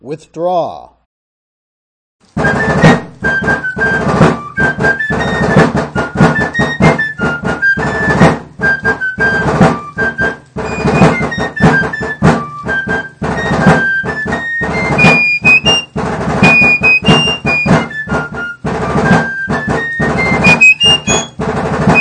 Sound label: Withdraw the Battalion